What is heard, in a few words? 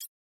ui abilities hover